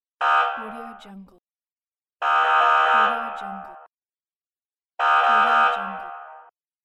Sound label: police car and wail